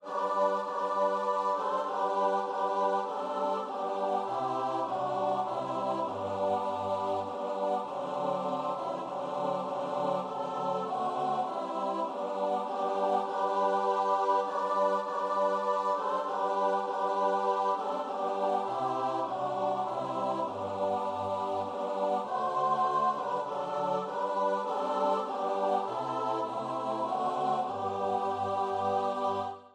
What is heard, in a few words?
away in a manger, choir